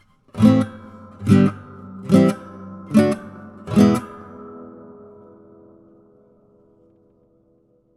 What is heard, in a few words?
Strumming; With ASPRI Demo